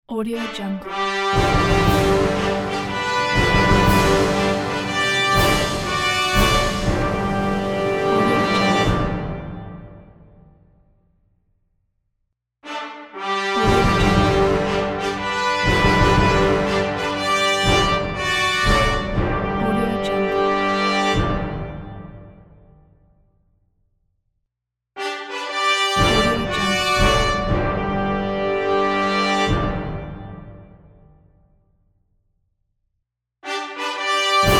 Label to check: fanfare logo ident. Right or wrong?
Right